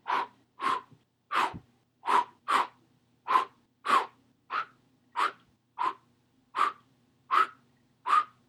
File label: Vocal Whooshes